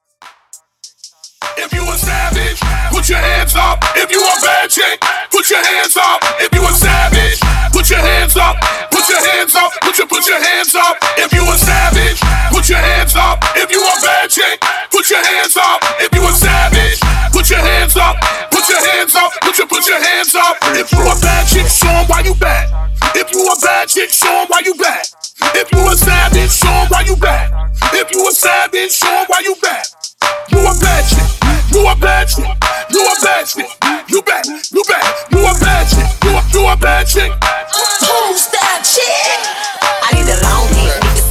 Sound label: Mashup With Hype Top40 100 BPM